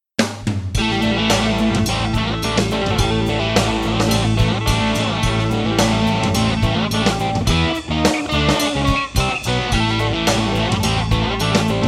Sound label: bulldog tele test